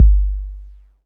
fluffy bass drum g key, kick drum tuned to the G note. Loudest frequency: 63Hz